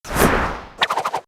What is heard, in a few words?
wink; bonny